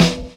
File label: puffy snare drum sound E key